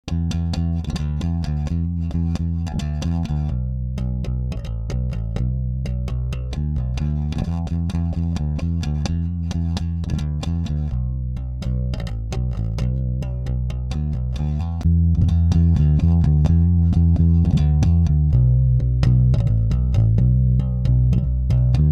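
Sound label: Rickenbacker EBS EQ; MidFeature Immer plus12 div Frequenzen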